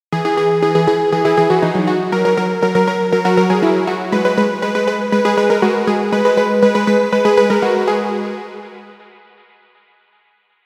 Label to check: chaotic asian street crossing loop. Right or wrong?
Wrong